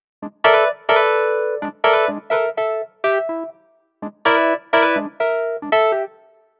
125 NuJazz Wurlitzer Emaj; E-Piano; Pro Tools Essential Loops; Digidesign

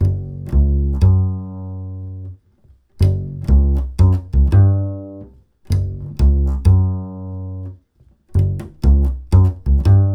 090 NuJazz Bass F# Maj; Pro Tools Essential Loops; Digidesign

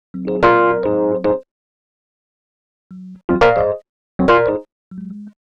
NuJazz; Digidesign; Pro Tools Essential Demos; Essential Loop Demos; Demo Session - Jazz

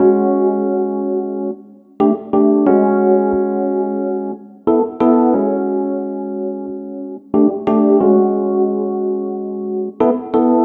090 NuJazz MarkII F#Maj; Piano; E-Piano; Pro Tools Essential Loops; Digidesign

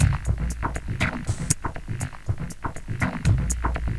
120 Dance E-Perc; Percussion; Pro Tools Essential Loops; Digidesign

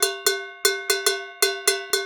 Dance Low Bell; 116; Dance DJ; Percussion; BellCowbellAgogo; Pro Tools Essential Loops; Digidesign

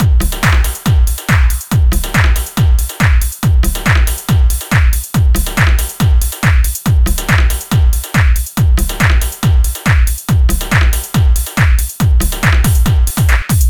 140 House Beat; Dance DJ; Drums; House Beats; Pro Tools Essential Loops; Digidesign

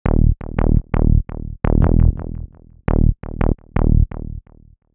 HipHop Synthbass A#Min; Digidesign; Pro Tools Essential Loops

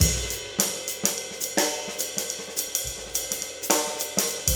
NuJazz Swing Drums, Jazz; Digidesign; Pro Tools Essential Demos; Essential Loop Demos; Demo Session - Jazz

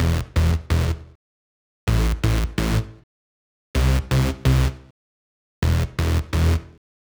128 house bass Em; Dance DJ; Pro Tools Essential Demos; Digidesign; Essential Loop Demos; Demo Session - Dance DJ

